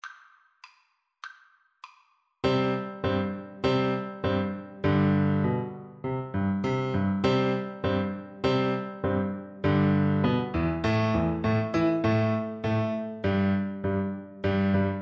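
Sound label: Turkish March, xylophone, Percussion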